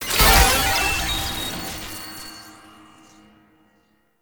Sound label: Friendly Use Boost; Warframe; Nineteeen Ninety Nine; PvPvE